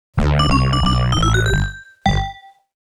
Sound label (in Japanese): ゲームオーバー; All Target Cluster